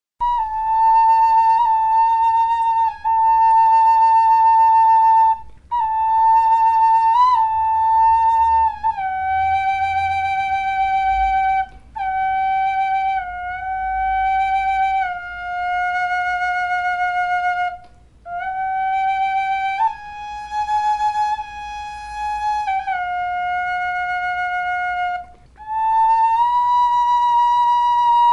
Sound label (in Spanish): flauta preciosa